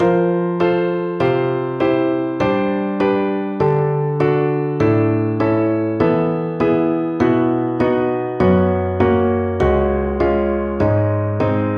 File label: Rock Piano